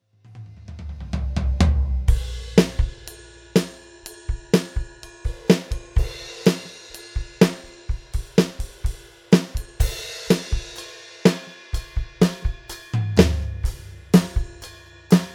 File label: ATV a5D Kit Unplugged Songwriter